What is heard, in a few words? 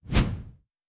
Whoosh; Fantasy Sounds Bundle